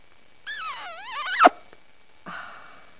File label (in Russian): poceluj visokij zhenskij